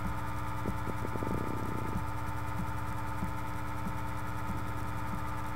Brosme Brosme Composite Call, Recording contains multiple sounds; Cusk